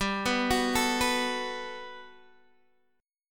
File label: G Major 9th, G, down, Guitar, Open G